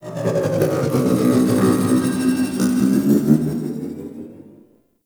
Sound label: Grungy Drone Passby; Ambience; Warframe; Corpus Railjack